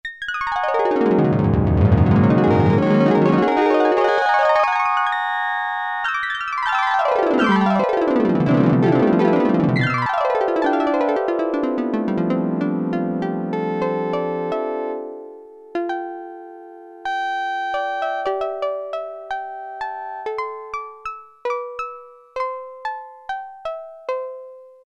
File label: HARP, Oberheim OB-8; Factory Patches